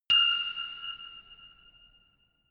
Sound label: notification glockenspiel creepy single high